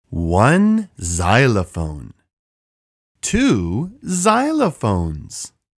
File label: Xylophones